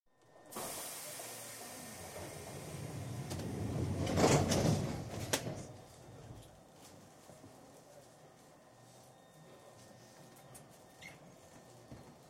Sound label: London Underground Train, interior, doors close, run, doors open (Recorded on the Victoria Line); BBC